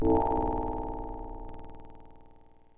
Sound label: warning; gnome-audio